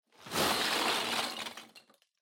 Loose Fill Packaging Take Out Contents; 梱包材

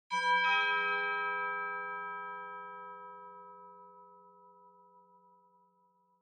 Doorbell Mech Tone High Far; ドアベル 機械式 トーン03-6(高 遠い)

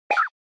Motion Eating

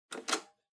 Portable Stereo Tape Pause

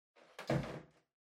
TV Hardware Far Pick Up

